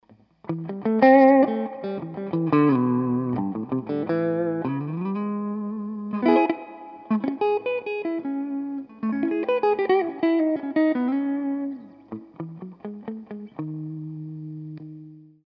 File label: walnut neck bridge pickup